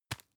tissue tap

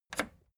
record player play release

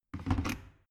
plastic water boiler place

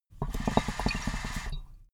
water pipe puff bubble short

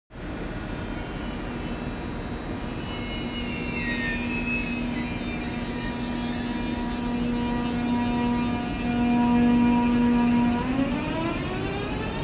train high speed train depart

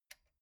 metal button stereo spring release

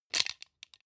plastic frame small hit rattle

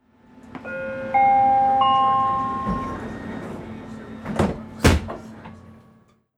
sky train canada cabin

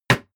block wood

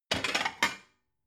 plate cupboard take slide hard

